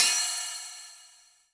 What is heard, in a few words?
oh; drumkit; switchangel